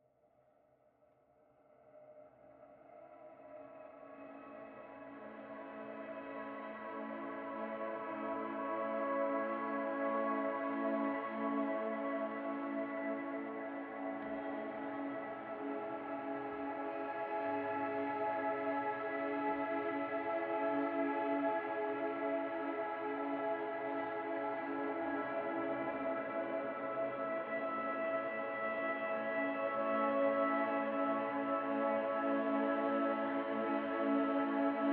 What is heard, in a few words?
Strings